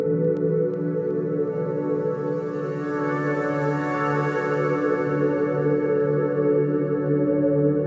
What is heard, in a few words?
122 BPM Pads Amaj Fmin Tonic D, ambient synth pads; MMB